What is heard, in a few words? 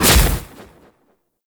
Shield Knock Down; Warframe; Warframes; Garuda